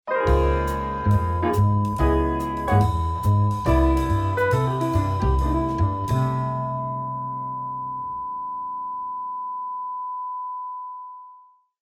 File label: groovy jingles